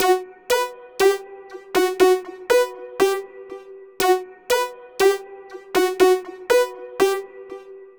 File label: Hi-Pass Pluck Synth; Sonic Foundry (Sony Creative Software); Club Joints and Street Anthems; Drop Top